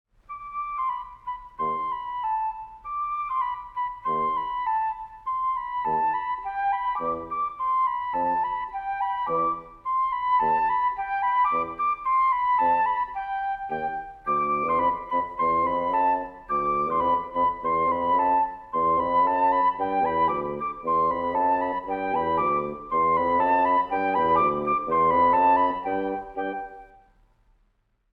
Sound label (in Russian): Медведь танцует под флейту; МУЗЫКА ДЛЯ СЛУШАНИЯ В ДЕТСКОМ САДУ (5-Й ГОД)